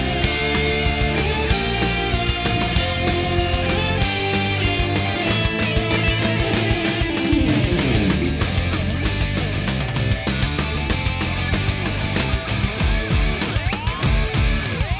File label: Main Theme (Short Instrumental)